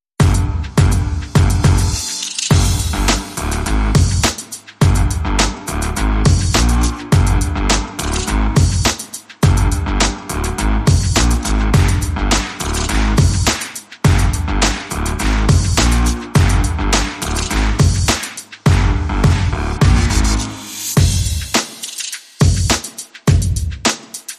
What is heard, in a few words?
Drums and Bass Only